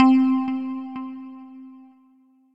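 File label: Old bells, Mallets; Oldbellz; Ableton; Factory Packs; Chop and Swing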